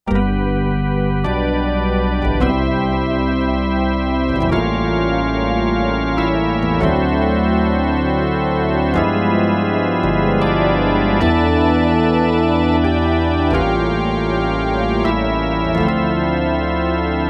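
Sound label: Gospel organ; Factory Patches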